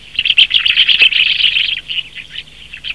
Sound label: Sturnus roseus